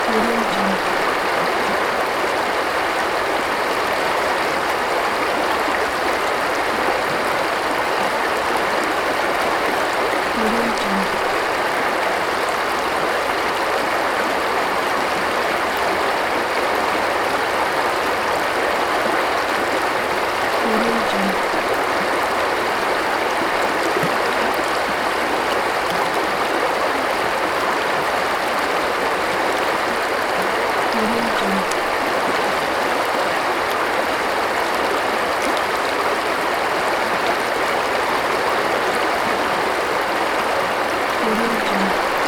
river water flow; hnatiukolha

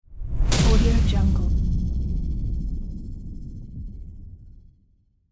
asteroid

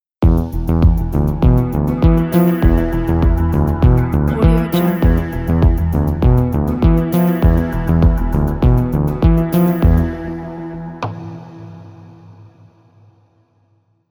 techno rhythm logo

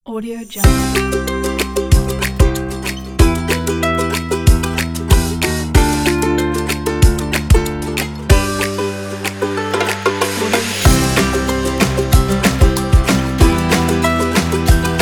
happy kids

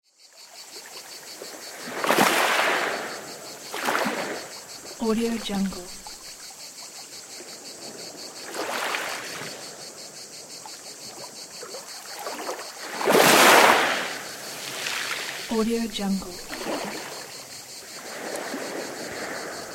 cicadas and ocean waves

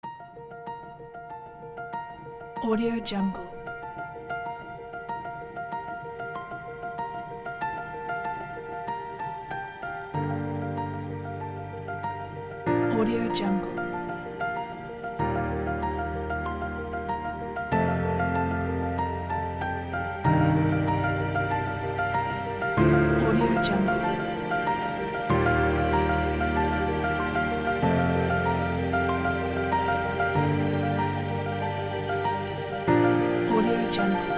romantic piano and strings

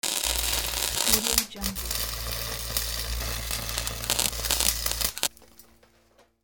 electric welding

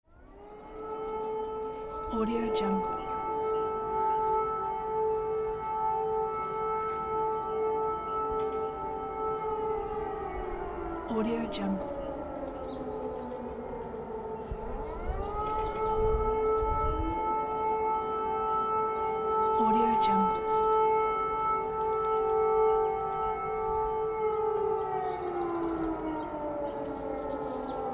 air alarm in city

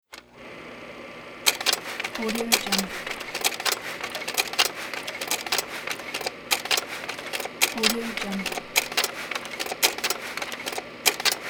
slide projector operating fast

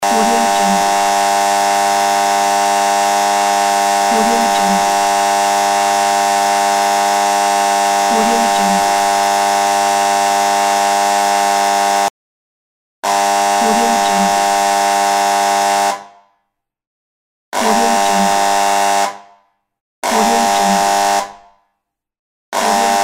buzzer

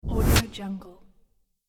sword whoosh

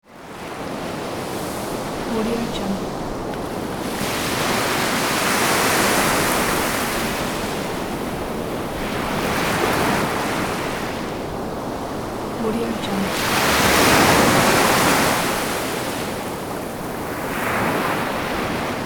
ocean waves with noise of the reef; soundtrips

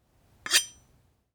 blade scrape

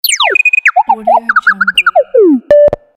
droid

